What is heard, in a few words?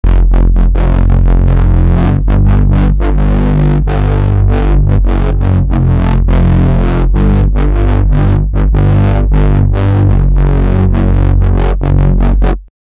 deep long bass from a